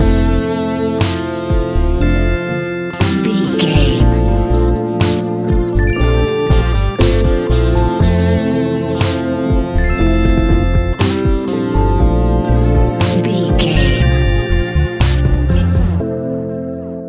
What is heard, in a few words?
moonlit whispers, A relaxed and chilling piece of music